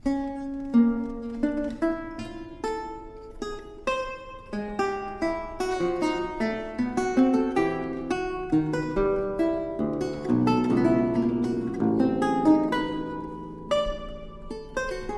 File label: lute suite fantasie